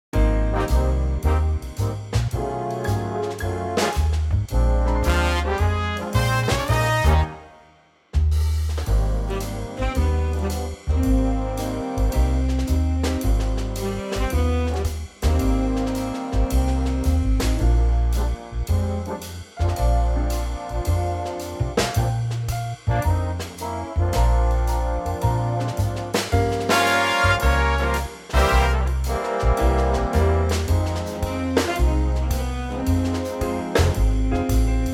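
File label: big band A flat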